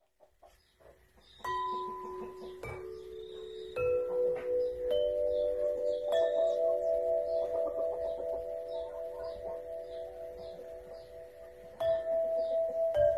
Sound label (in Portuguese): Pentationica Eolica